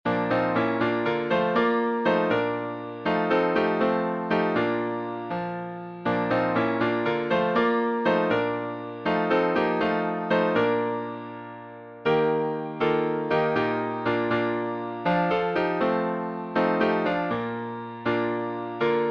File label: Hymns